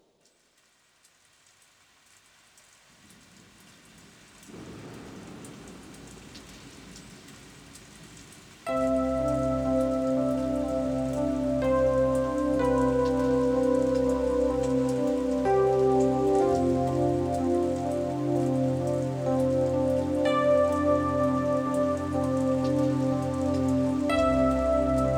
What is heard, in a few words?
rain; Ayurveda